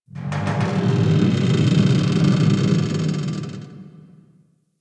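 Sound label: Metal Creak Distant One Off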